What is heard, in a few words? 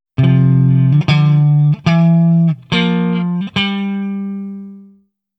Back Guitar; K-ON